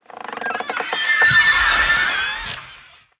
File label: creaky door; soundscrate